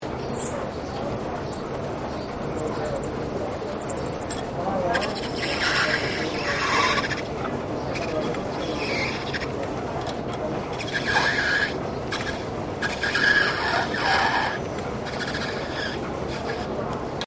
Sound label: LATHE METAL TURNING OPERATION, Colonial era machinery; Machines